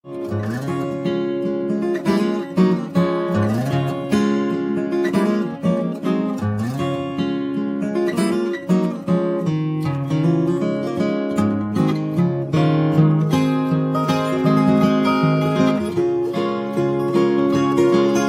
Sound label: Slow Drag Ragtime